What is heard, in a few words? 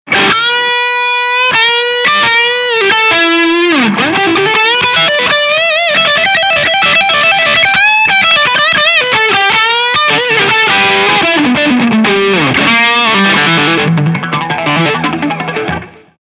Trio Tango Lead Chanal Gibson Solo